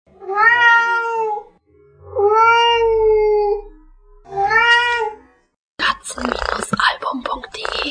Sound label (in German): Kater will rein, Kater steht vor verschlossener Tür; katzen-album